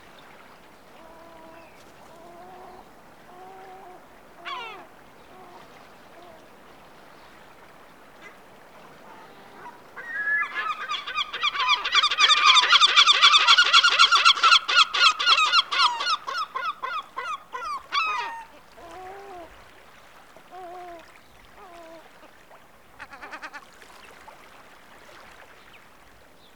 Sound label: Black-backed Gull